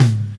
90s Raw Tom Sound A Key, tom drum sound tuned to the A note. Loudest frequency: 353Hz